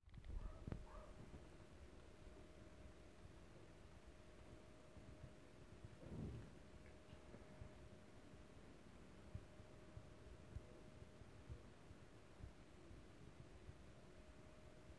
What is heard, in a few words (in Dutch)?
hond; wederom alweer aanwezig half de avond